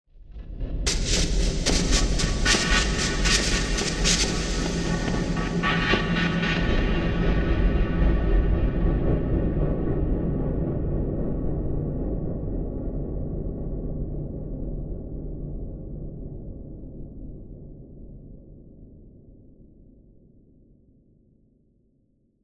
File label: Tribal Accent Mysterious; Musical Sound Design; Blastwave